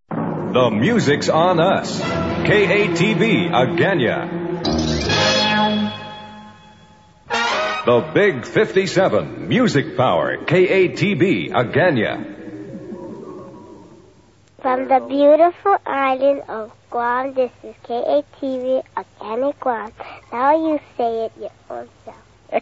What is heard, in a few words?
interval signal; GUAM; KATB Agana